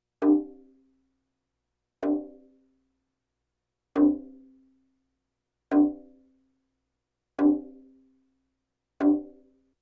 Percussion Bongos Tympani rolls Gongs Drums

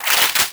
Gun Weapon Pickup; Pickups

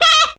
hit chickens bukuk; Blind Drive

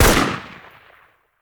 Rifle; Sound Ideas; Guns

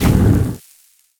Fire Tank Footstep; AudioWizards

